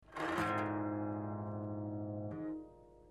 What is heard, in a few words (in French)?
tige retrait, harpe